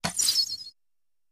Arrow Whistle Bys Launch; Arrow Launch From Pipe And Whiz By. - Archery; bow & arrow